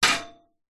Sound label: metal frame short sharp bash hit